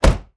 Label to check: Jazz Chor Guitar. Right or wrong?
Wrong